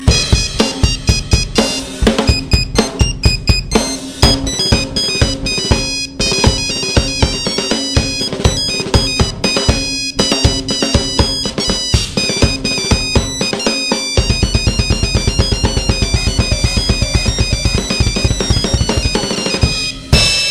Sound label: Drums; Nokia